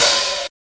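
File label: crummy open hi-hat; Drums In Your FACE!; HIP-HOP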